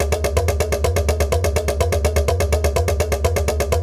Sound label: Electronic Perc